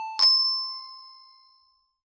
picks up a coin in; achievement

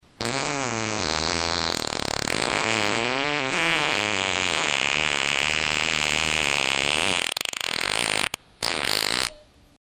long fart sound; sound effect

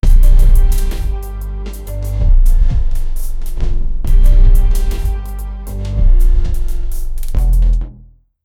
soft angry music

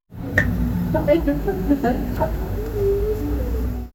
watching tv together

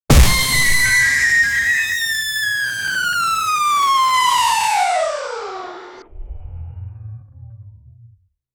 animatronic jumpscare

